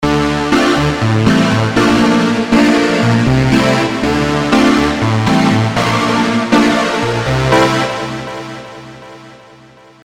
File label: V+ Chords Example